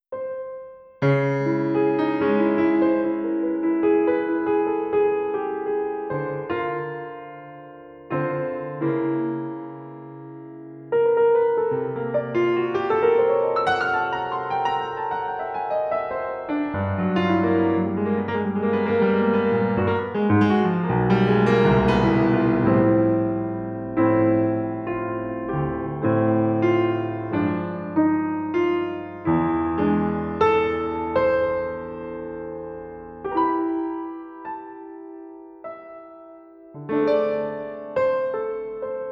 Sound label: piano